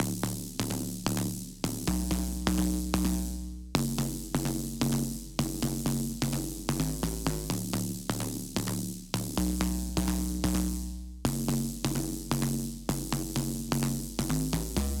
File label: 128 BPM F# Min Bass; House; Drop Loops; Cymatics; Mothership